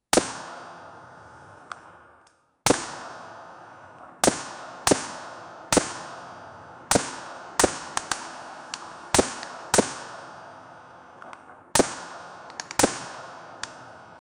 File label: fire with ak 47 rifle, automatic fire